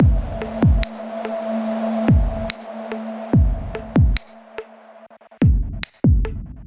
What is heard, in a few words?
Reggae Microbe F, 72